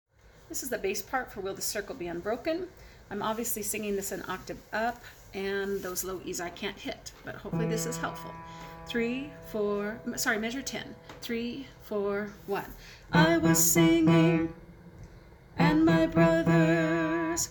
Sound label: Bass; Will the Circle Be Unbroken